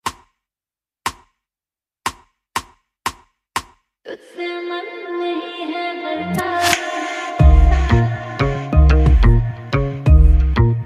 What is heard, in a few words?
Reggaeton